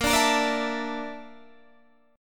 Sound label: Bb Major Sharp 11th Bb down Guitar Half Step Down, chord